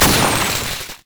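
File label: fireworks missile barrage; Fireworks Corki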